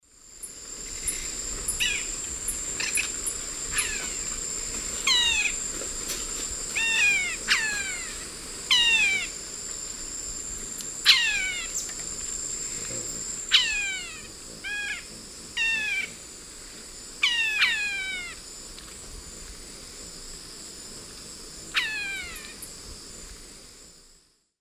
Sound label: Dendrocolaptes picumnus, calls pair; S. America; Eastern Lowlands